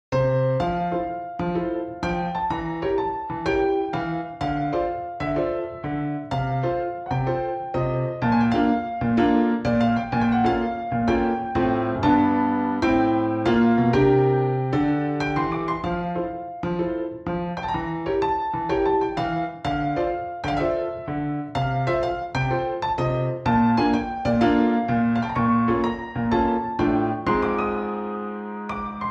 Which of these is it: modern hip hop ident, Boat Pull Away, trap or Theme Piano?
Theme Piano